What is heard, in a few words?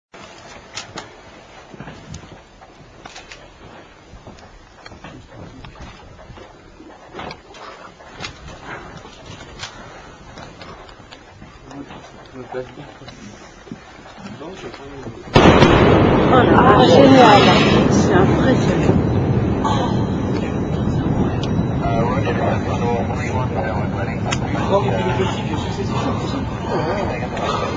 Concorde breaking the sound barrier; barreira SOM